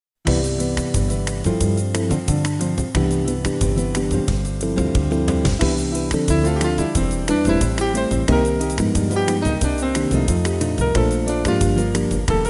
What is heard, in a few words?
Jazz